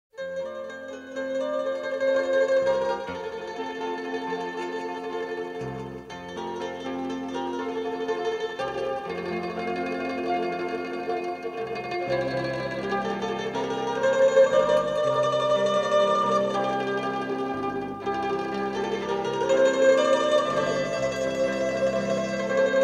Mandolina

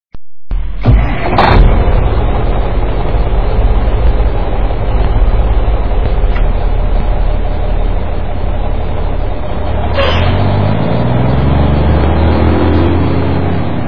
camion

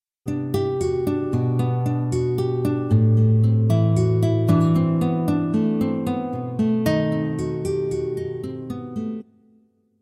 GUITARRA

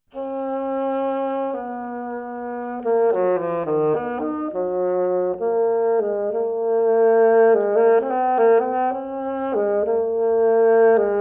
Fagot; Beethoven; Sinfonía nº 6

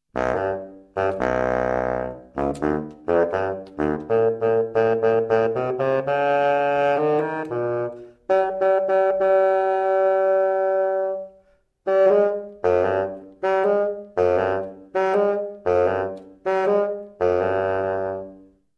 Contrafagot

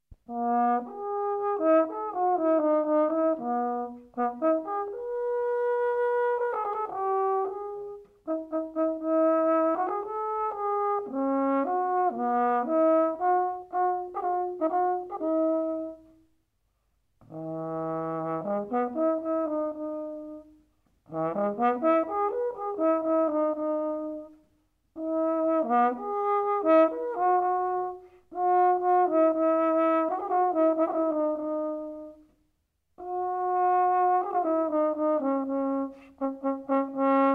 Trompa, concierto KV 447; Mozart

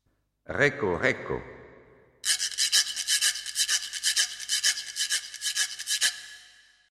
Güiro

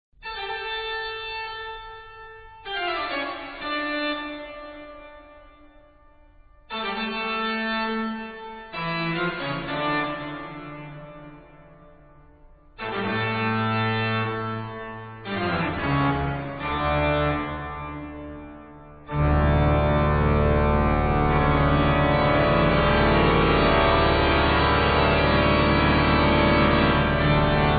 organo

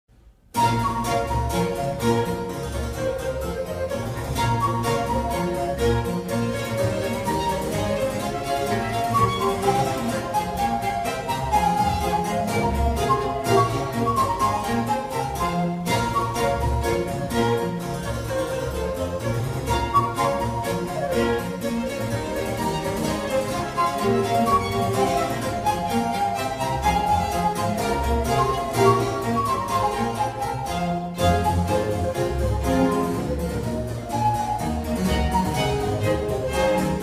flauta traversa

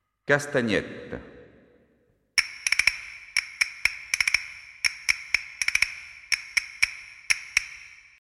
Castañuelas

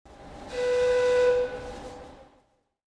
pito del tren

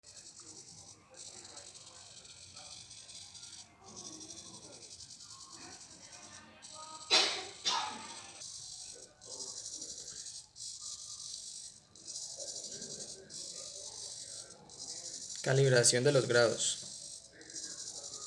video maquina

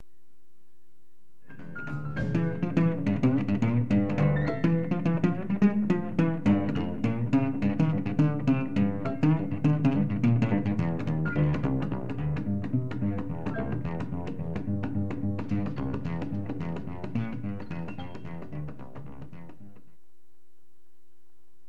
contrabajo; instrumentos

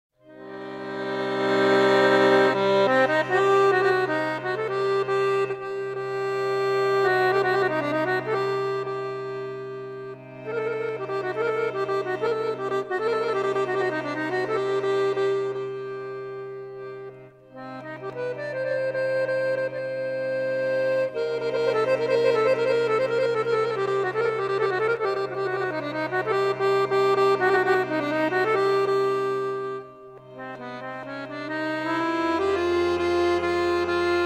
Acordeón

